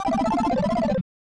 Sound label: Unused Jingle; Super Double Yakuman; SNES